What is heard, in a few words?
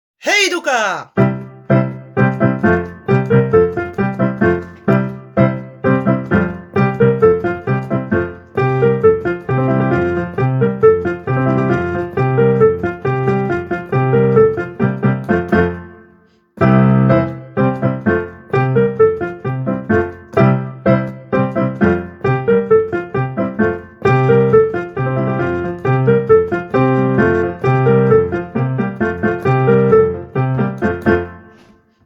piano; cepelia; folk academy